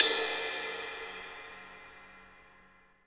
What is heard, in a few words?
CYM RIDE, KIT Real-Kit; 90 s Sample CDs; Sound & Vision - Gigapack I CD 1 (Roland); Real-Kit s11